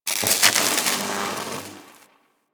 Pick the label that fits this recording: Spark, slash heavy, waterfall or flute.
Spark